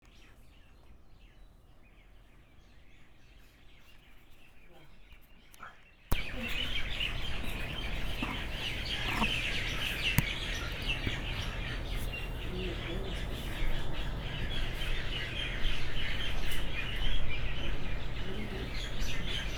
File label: morning bird freakout; paia